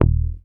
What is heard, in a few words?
HOFNER MUTE, Bass